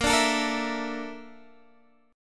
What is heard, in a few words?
A sharp Major Sharp 11th, Guitar, Standard; strummed; x,13,12,14,11,12; capo=11